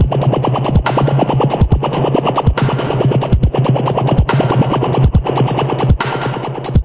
140 bpm, whup whup swing warfare, drum loops and breaks